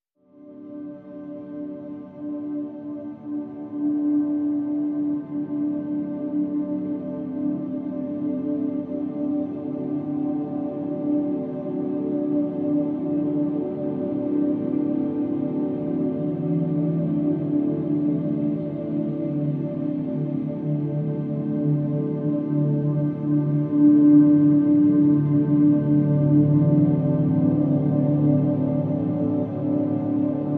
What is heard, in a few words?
PADS Distant Lights (D)